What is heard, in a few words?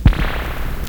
scientific dub, spring reverb; clocktower records